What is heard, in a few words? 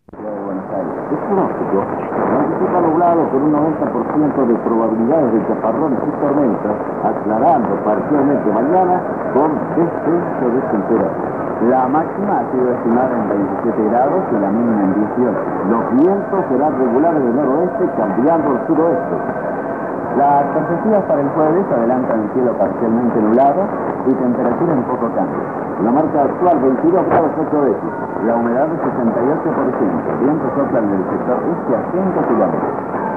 mwdx 950 khz; r belgrano buenos aires